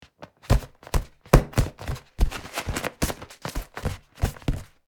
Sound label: Inflatable beach ball taps hand hits